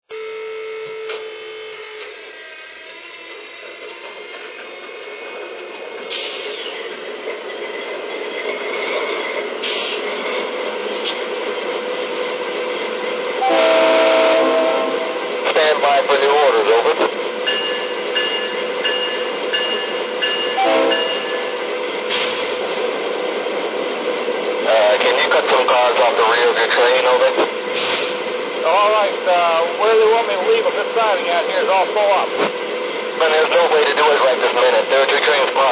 DASH8

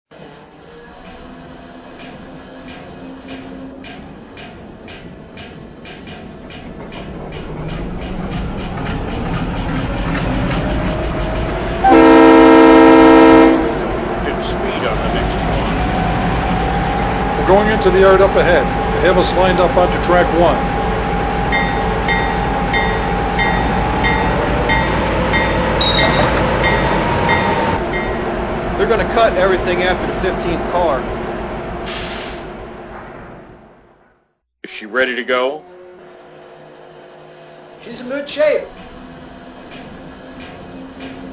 GE U25B

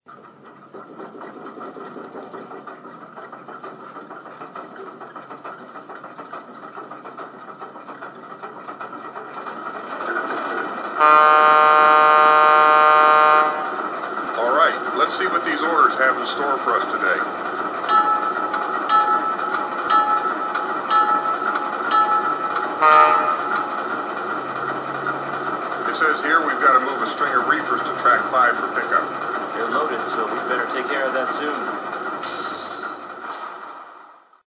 Alco RS-1, trains

